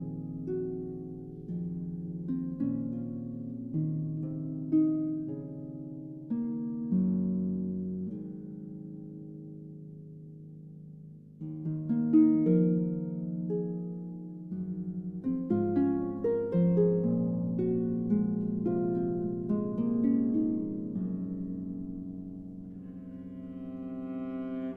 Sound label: Harp Concerto Lullaby